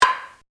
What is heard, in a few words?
bat